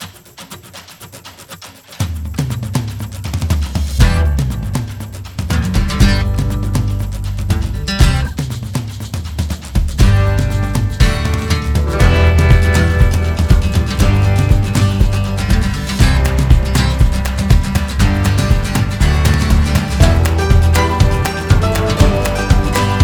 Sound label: Folk